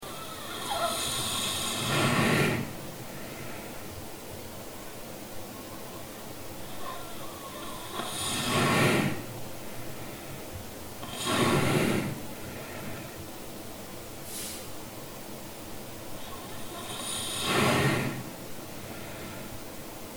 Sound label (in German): Schnarchen